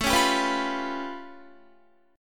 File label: A Augmented 9th A, Guitar; A+9 strummed